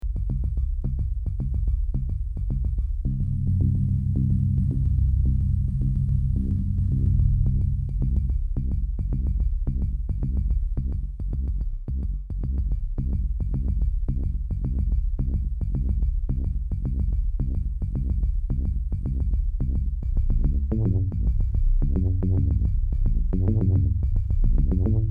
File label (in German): Bassklischees